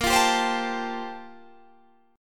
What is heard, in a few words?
G Minor 11th A sharp